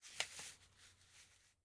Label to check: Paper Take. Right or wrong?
Right